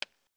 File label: footstep plastic; fallguys